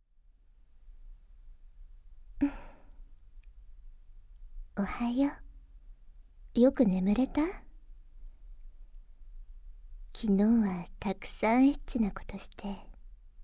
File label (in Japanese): ぐっぽり耳舐め (耳舐め), ASMR; ぐっぽり耳舐めで極上射精